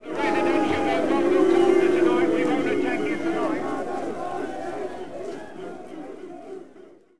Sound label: lose; cstrike; next21 duels